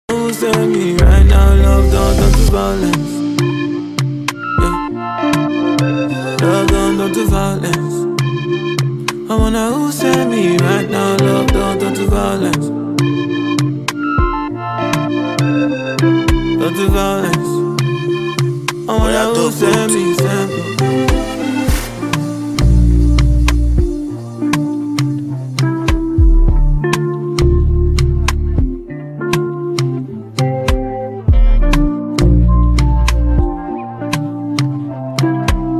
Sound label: OPEN VERSE Instrumental BEAT HOOK